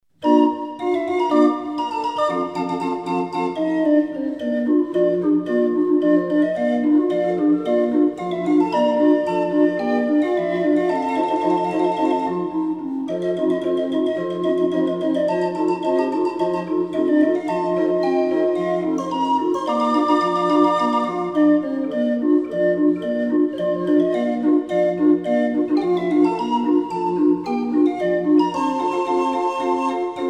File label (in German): 20er Drehorgel